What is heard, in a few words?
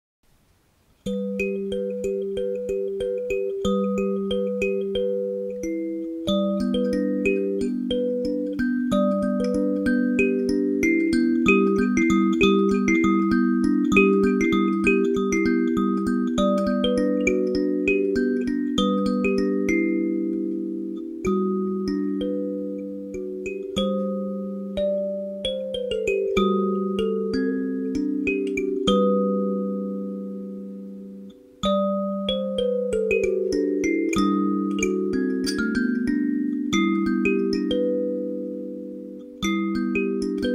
oak kalimba; 11 tone kalimba